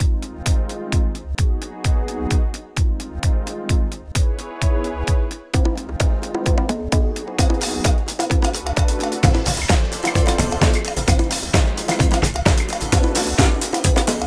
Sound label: Jazz Beat